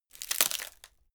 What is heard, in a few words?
wood break